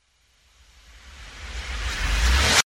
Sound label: whoosh impact; RADIO STAR